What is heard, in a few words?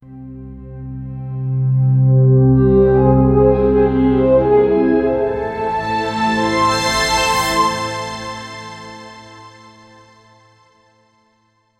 soothing video game power, power up